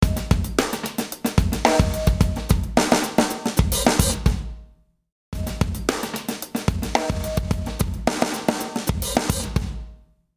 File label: Drums 76 Drum Slam